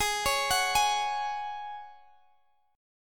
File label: Ab 7th Suspended 4th Ab down Guitar Drop D